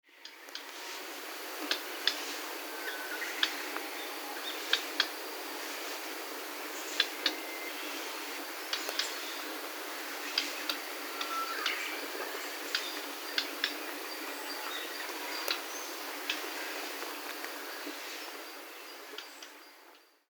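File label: Siberian Blue Robin; koe; nagasaki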